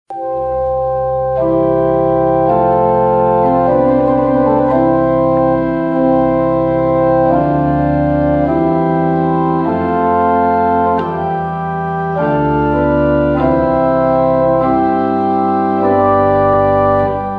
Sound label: Trumpet in C-Dur; OEOM Heron Trumpet Vol